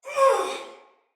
Pain Soldier Female; Battle; Barks; Dialog; Sinecure Soldier VIP Female A

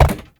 Step Glass; Warframe; Uriel

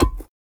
Grenade Land; Vehicles; Abilities; Warframe; Nechro Mech

